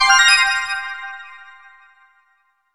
Unlock; kids park kaitou sentai lupinranger vs keisatsu sentai patoranger

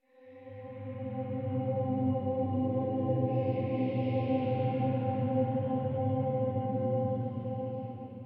ambience chanting mantra; Rage in Peace

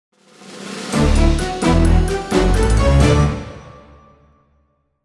jingle level end perfect; Seven Seas Solitaire

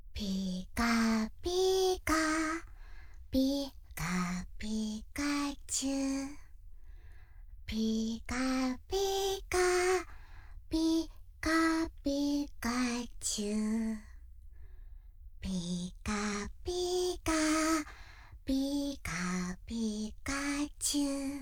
Relaxing Sounds, Lullaby

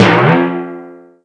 bounce, hot potato

